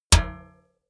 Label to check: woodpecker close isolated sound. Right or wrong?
Wrong